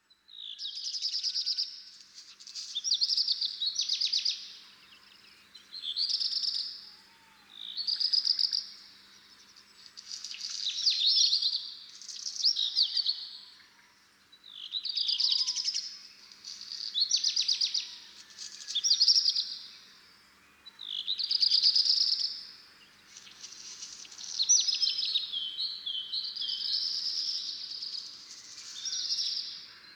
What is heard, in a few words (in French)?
Rougequeues noirs, Concerts naturels de l'Isere; Studio Les Trois Becs